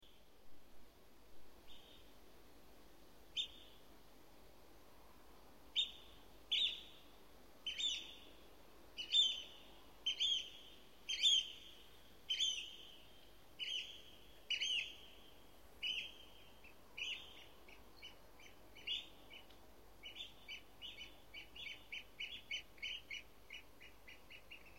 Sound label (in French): huitrier pie, chant